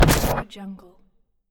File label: gritty scifi impact